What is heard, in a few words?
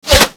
Bullet Default Hit